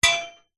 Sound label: impacts metal hollow frame hit sharp strike